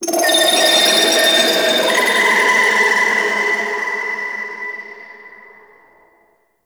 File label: Clicky Texture; Warframe; Ambience; Entrati